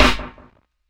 SNARE FLAM, DRUM N BASS KIT; DRUM N BASS:JUNGLE BEATS; DRUM N BASS KIT 2

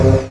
DM ORG1, Dance Mega Synth

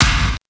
Fairlight CMI III Kick

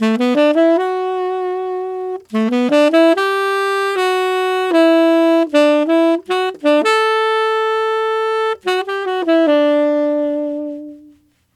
Tenor Rock Ballad in D maj, Tenor Sax Loops; Sonic Foundry (Sony Creative Software); Jazz Solos and Sections

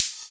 Hhat, HiHat; Equipped Music; Loungin House

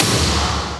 big snare; M8

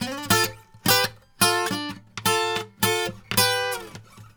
Blues Guitar; ILIO; Fretworks

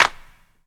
CLAPS HIP, CLP; Best Service Dance Mega Drums

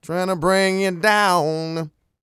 SING, Vocal Planet R&B & Dance; ILIO

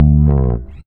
REAL BASS, BASSLICKS; Ueberschall; Jam Box

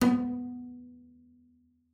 B3, bass pizz; Xsample VOL-5

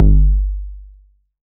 808 bass drum Tape Sat; Goldbaby Tape808 & Tape909; The Tape 808

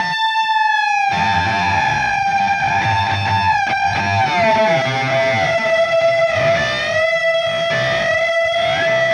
Clinical Slide Delay Guitar; Sonic Foundry (Sony Creative Software); Indie Rock Anthems; Session 02 - Clinical

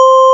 BELL C5; Big Fish Audio; Synth City

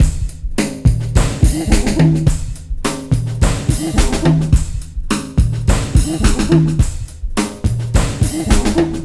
FUNKY 106 bpm; FUNKY MONKEY; 90 s Sample CDs; Planet of the Breaks

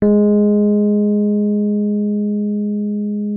MK1F G#3, RHODES; Xsample VOL-1

